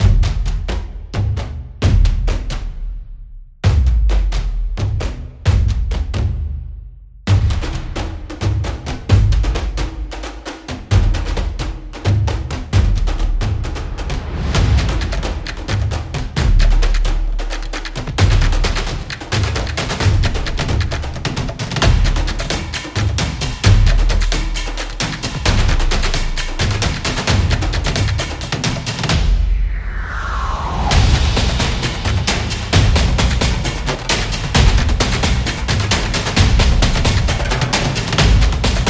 Drums Tribal

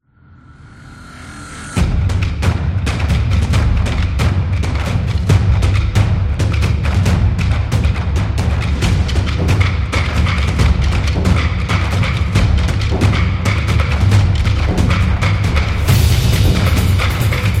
Japanese Taiko

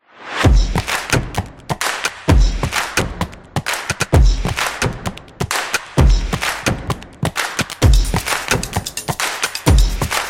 Learning

